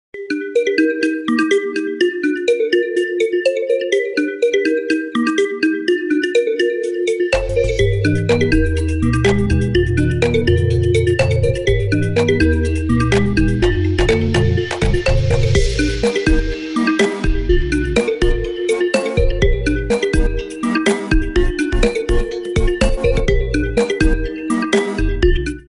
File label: Vivo Blue Meteor Shower Ringtone